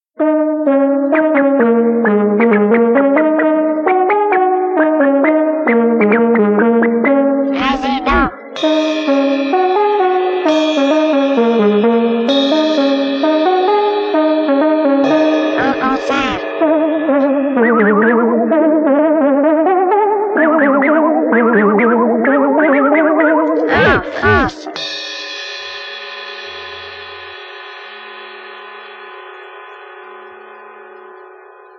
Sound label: Jingle